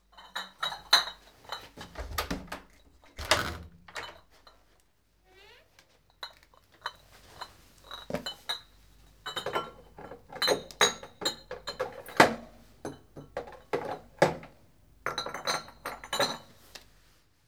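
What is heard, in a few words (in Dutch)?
melkboer flessen binnen uit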